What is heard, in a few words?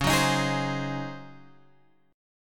Csharp Major 7th Suspended 2nd Suspended 4th Csharp, chord; C#M7sus2sus4 Chord {9 9 X 8 9 8} strummed